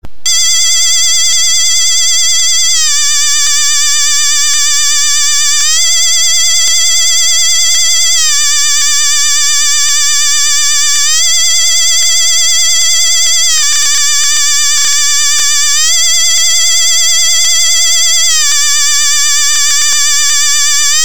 Ghost sound

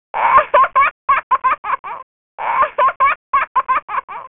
Kids laughter

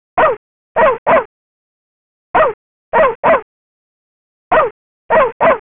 Puppy dog

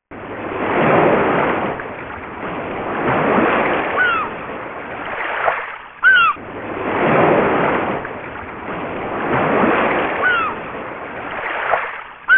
sea sound sea gull sound

